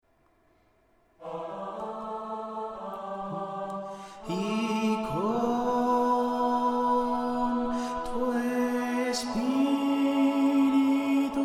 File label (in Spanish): Tenores, responsorio